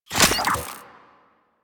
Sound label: Rifle Reload; Crp Ruban Rifle